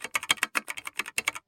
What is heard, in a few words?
type fast, keyboard; huashu design; sfx